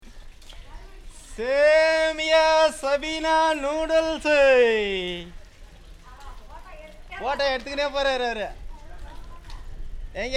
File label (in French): vendeur lessive chien